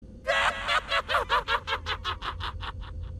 bitter giggle jumpscare

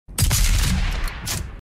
shotgun sound

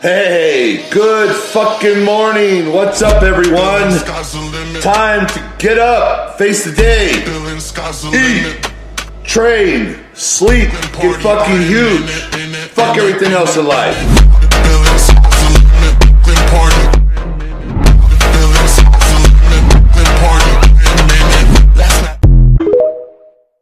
rich motivational

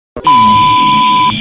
ee

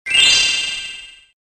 gem sound effect; spyro

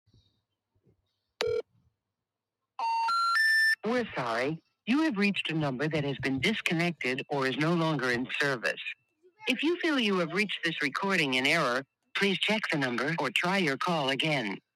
not in service beep

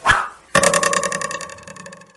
cupid arrow

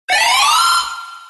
buzzer, effet sonore; questions pour un champion

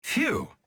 pheww

